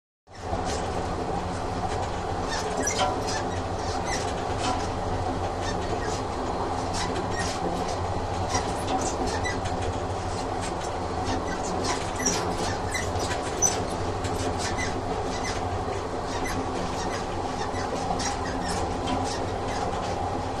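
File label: Diesel Train Interior Steady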